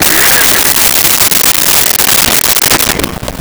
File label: Bronx Cheer, Comedy Vocals; Vintage Cartoon; SONY PICTURES SOUND EFFECTS SERIES